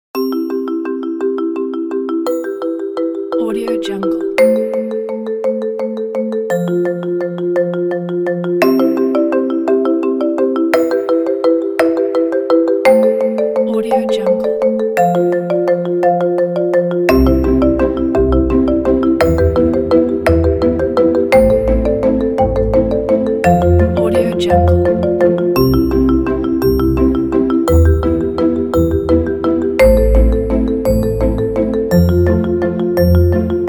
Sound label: inspiring marimba